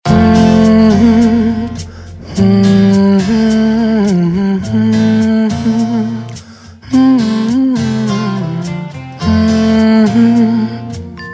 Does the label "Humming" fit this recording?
Yes